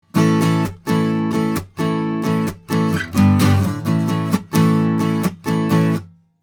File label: Austrian Audio OC818 A Git harder 40cm